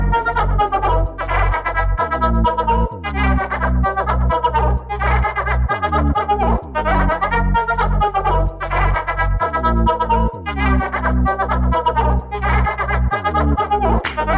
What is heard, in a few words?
house vibes ringtone